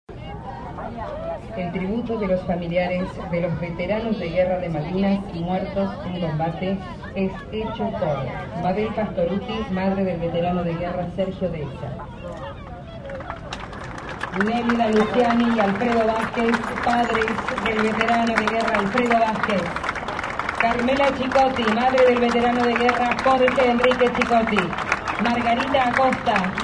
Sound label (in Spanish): actos guerra malvinas; 25 anos guerra malvinas; m a los caidos en las malvinas